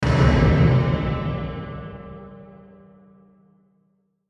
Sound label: Hit Drum Low; Hit Drum Low Reverb Metallic; Blastwave